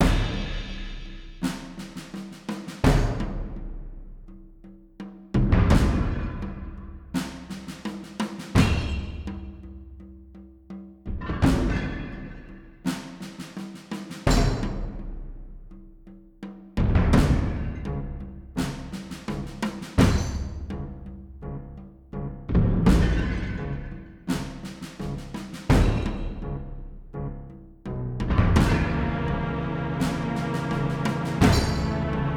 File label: Town Music Loop